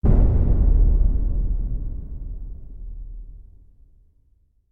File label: Hit Lo Taiko Sub; Brand X Music; Brand X Music Catalogue - Editor's Essentials